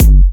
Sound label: Bassdrum; Vengeance Samples